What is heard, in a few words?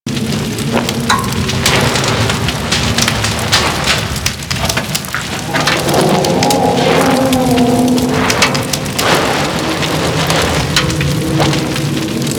Fire Burning Ship Loop; Warframe; The New War; Plains Of Eidolon; Mission One